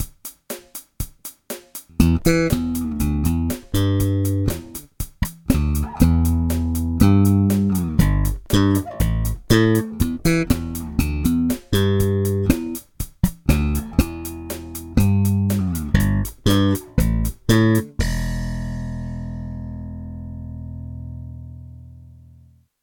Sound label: Bubinga Vette slap